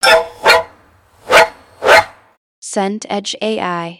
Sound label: dog bark series